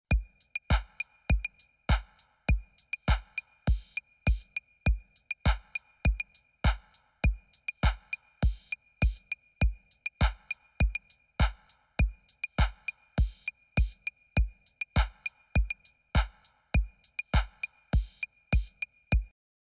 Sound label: Beat only; Bastl